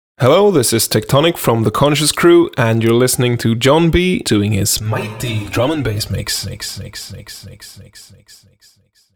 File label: shout